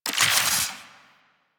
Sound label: Alchemist Infuse Poison UI; Warframe; DE; Warframes